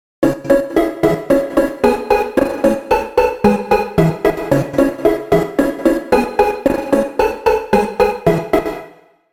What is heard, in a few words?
Strings